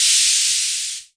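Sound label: cymbal, Hammond Drum Machine; DRUM MACHINES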